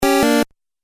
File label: 8 Bit Style Fail; Video Game Sound Effects